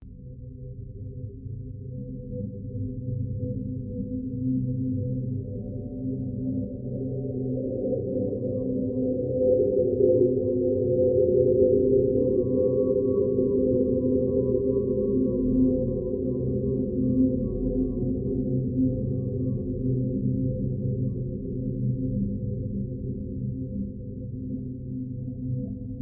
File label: whale resonant ambiance; Ambient; DailySounds